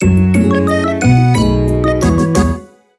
Home Menu Banner

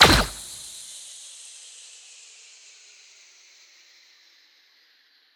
Logo Impact; Boomerang Fu

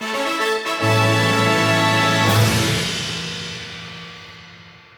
Goal Fanfare; crash bandicoot series sound box; Tire and Ice

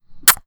KISS; don t be afraid the first toy

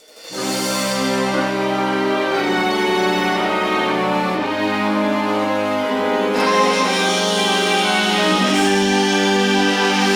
Course Intro Fanfare; crash bandicoot series sound box; Dead Heat

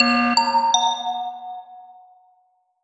Report Emergency Meeting; Among Us

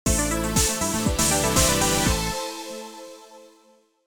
Gameover SHUT DOWN

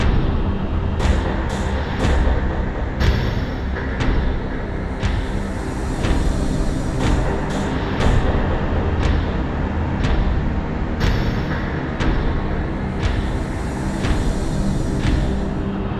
Danger Tonal; Insanely Twisted Shadow Planet

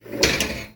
pinball flip

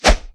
Weapon Two Hand Sword Miss

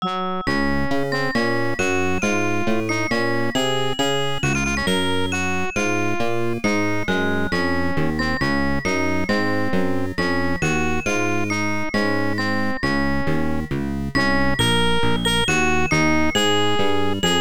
Ending Music; The Return of Ishtar; PC-8801

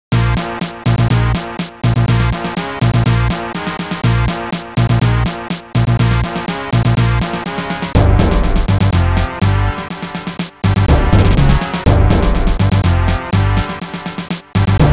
Incoming Message; Judge Dredd; SNES; Type D

